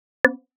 bois

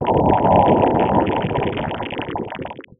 eboulement

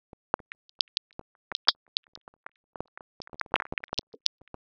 cailloux

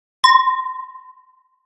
verre

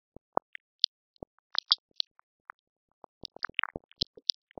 cailloux

